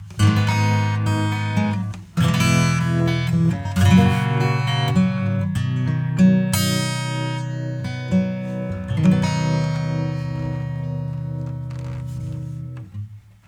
VolumeShaper 6 Speed 2.15Hz LFO Bands Split

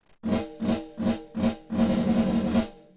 Cinematic rooms REFLECTIONS Rolloff 500Hz; X-FEED

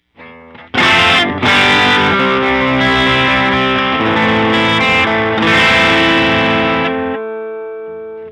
guitar; SKYE Clipper

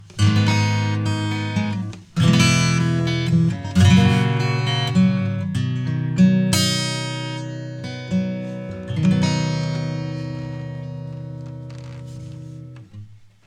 Lindell 80 Series EQ High Boost 10KHz normal

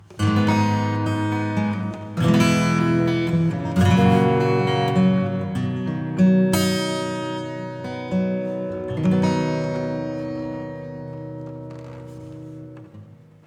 Acoustic default

